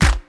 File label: tight snare b key; snare drum samples